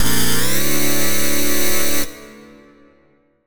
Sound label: Level up